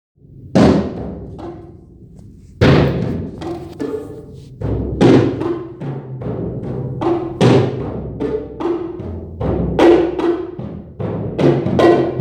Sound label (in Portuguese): percussao; cristianorios